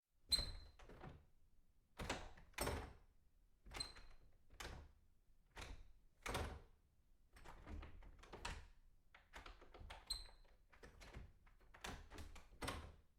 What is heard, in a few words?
doorknob opening